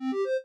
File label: win